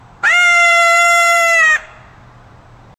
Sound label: eagle call; birds